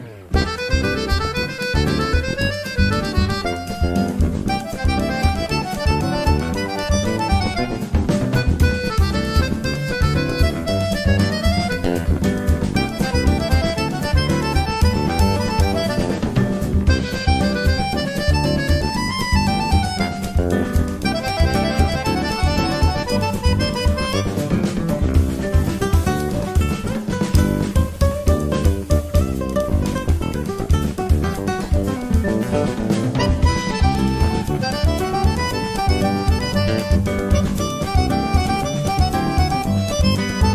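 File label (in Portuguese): Orquestra Sanfônica Ao Vivo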